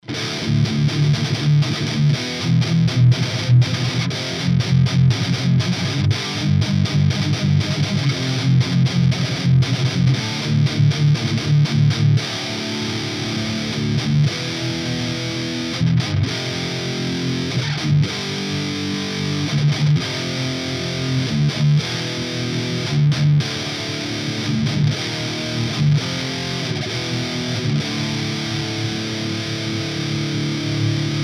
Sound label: riff